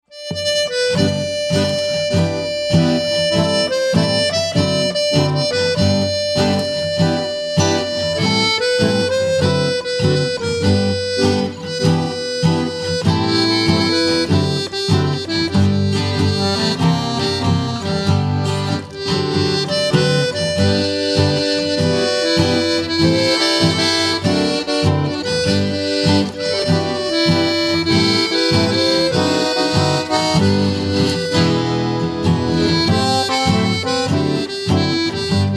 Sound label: guitar duo